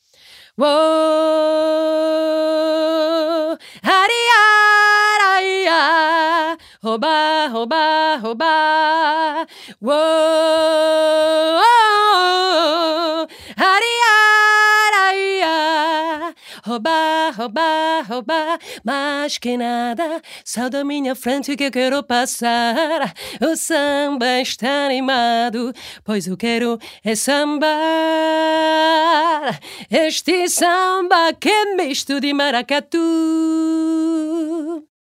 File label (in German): Gesang